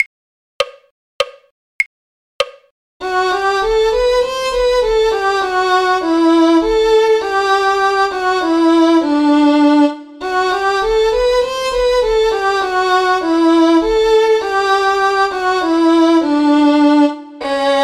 violin; carols

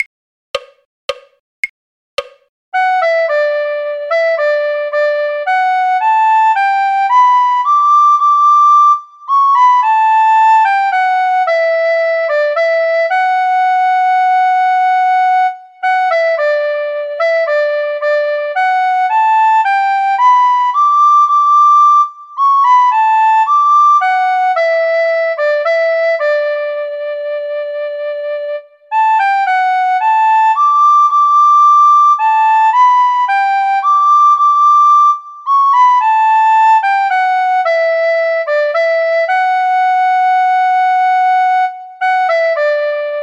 recorder; folk

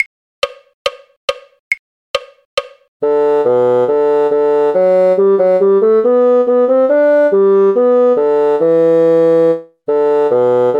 bassoon; soldier soldier wont you marry me; folk

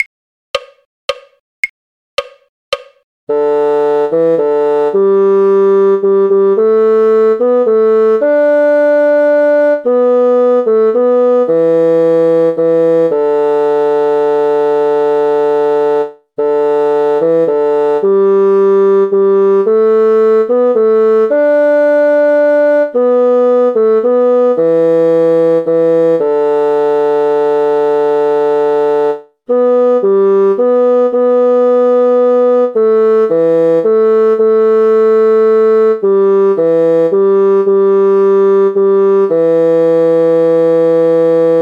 bassoon; skye boat song; folk

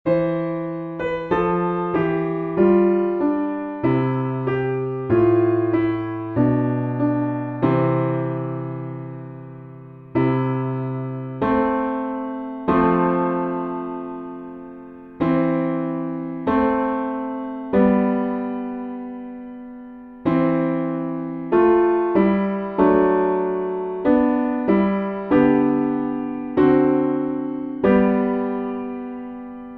piano backing, hymns; recorder; crown him with many crowns

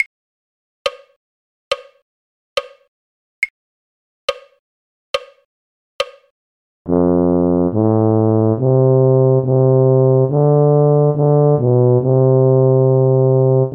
tuba; carols

